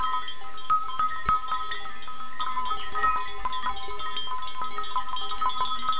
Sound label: bells